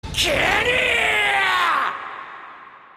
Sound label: screaming; levi; kenny